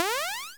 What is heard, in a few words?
8 Bit Jump